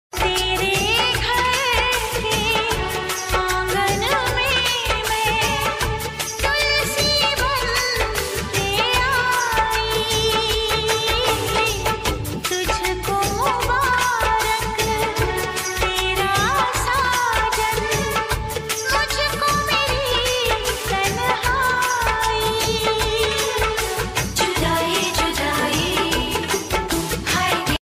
sad song ringtone sad music ringtone; judaai movie

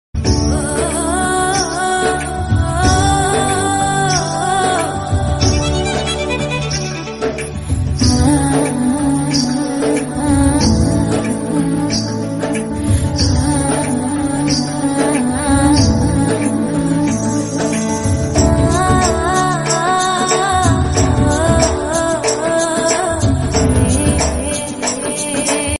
emotional love ringtone bgm

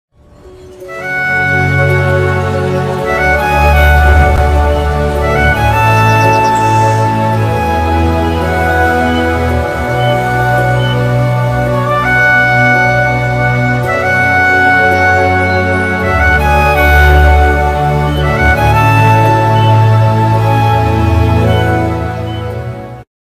flute music flute music flute ringtone

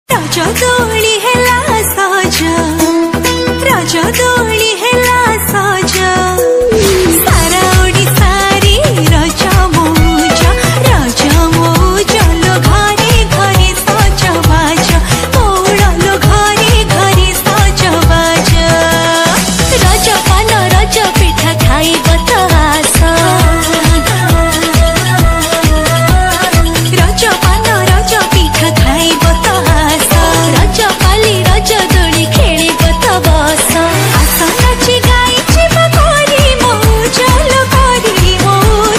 odia ringtone; raja doli; raja special

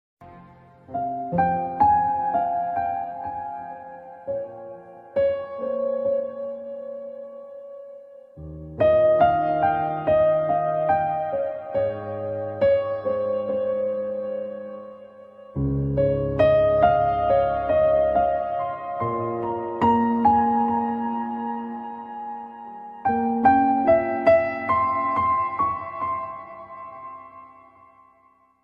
theme music; aashiqui 2

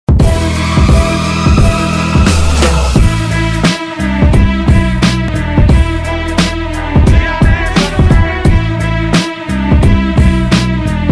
background music ringtone; scam background music ringtone

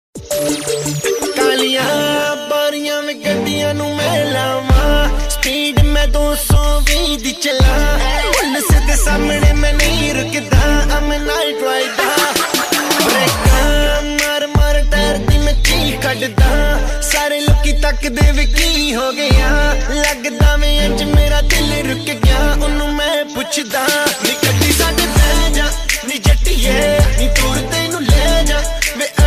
ringtone boys attitude bgm ringtones

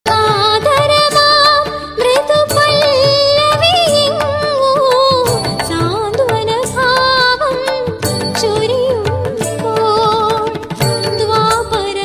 malayalam song; malayalam evergreen songs

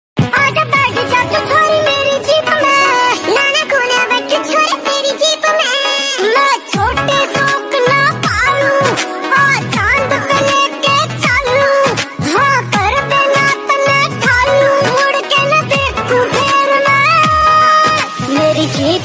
song ringtone voice change

